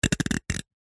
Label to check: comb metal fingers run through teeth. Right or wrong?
Right